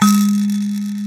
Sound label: sanza 4 G2; AKAI S-Series CD-ROM Sound Library VOL-5